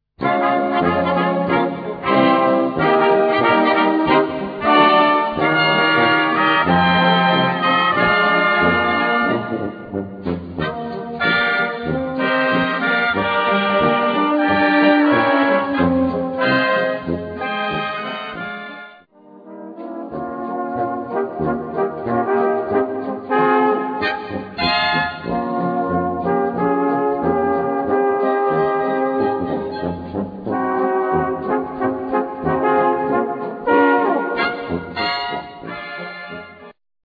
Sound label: FOX; AM BUCKEL